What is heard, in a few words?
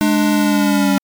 8bit